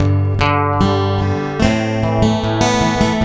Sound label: Picking Am 77